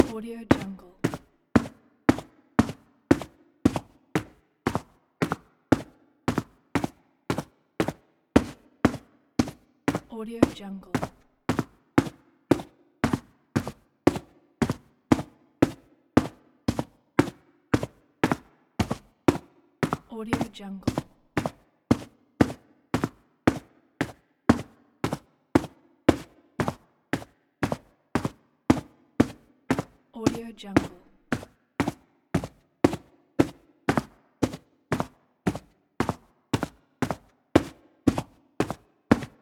steps

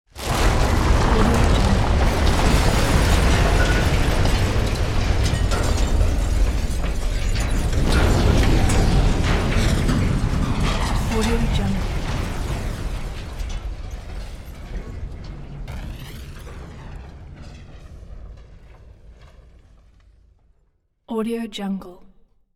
concrete and steel building collapse

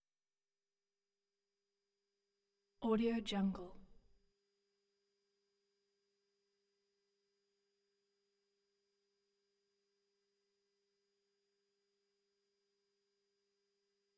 ear ringing

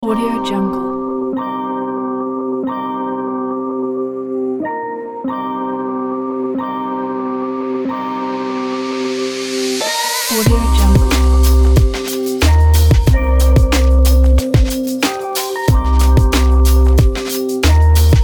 fashion minimal hip hop

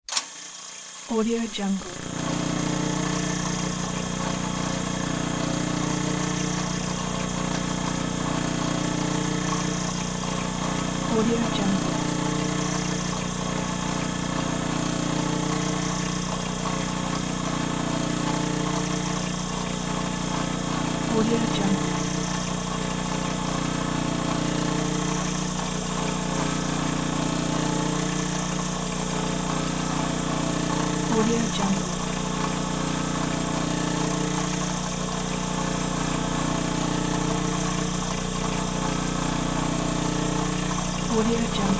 small electric tool motor; hollywoodedge

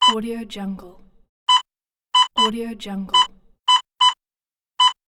barcode scanner; blackdragonfx

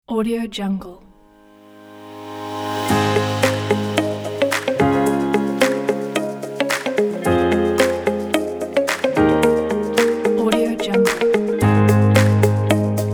business corporate background

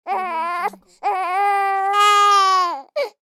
baby crying

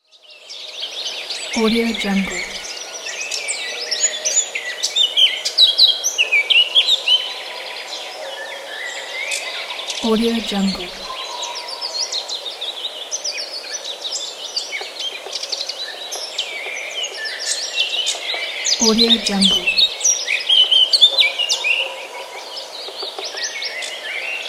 birds in forest near village

